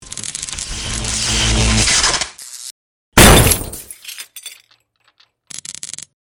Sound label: Electrical Boom